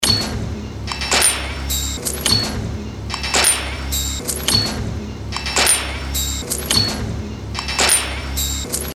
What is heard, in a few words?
danger fx loop